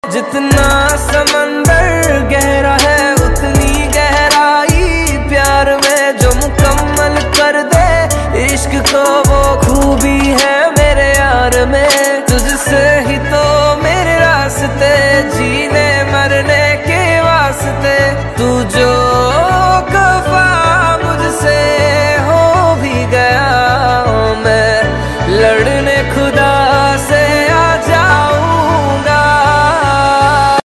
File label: Ringtone